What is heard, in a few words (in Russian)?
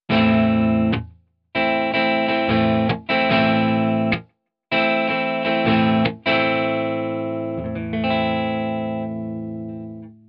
Klassicheskij boj vosmerka s glusheniem